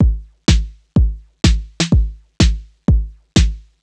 drum noclap stripped 125, Drum Loops, Classic Chicago House